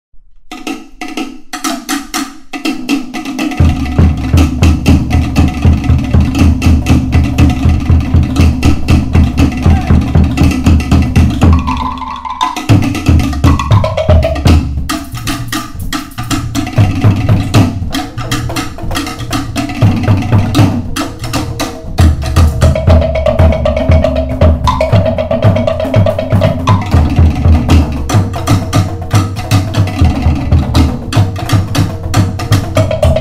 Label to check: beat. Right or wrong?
Right